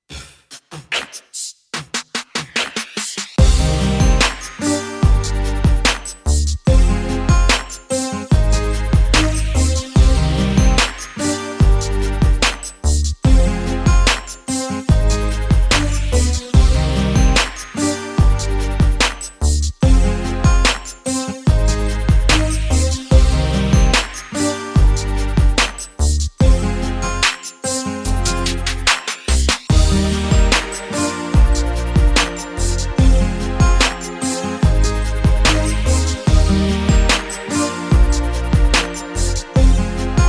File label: Modern Pop